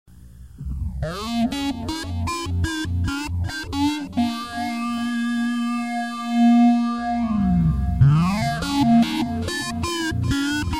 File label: Distortion